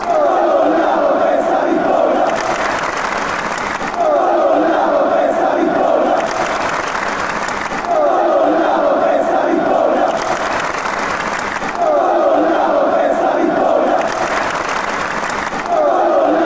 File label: fanchants; victory 18